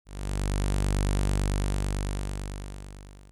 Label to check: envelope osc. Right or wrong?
Right